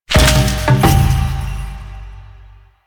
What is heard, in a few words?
Boon Notification Cue; Warframe; Koumei